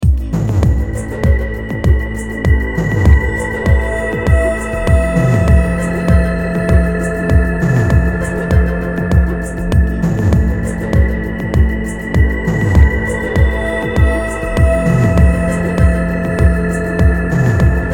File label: all sounds from polychr